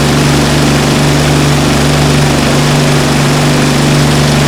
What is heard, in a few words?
L2 Engine Max; DCS